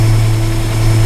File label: Servo Medium LOOP